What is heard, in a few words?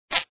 sound (tape); Factory Balls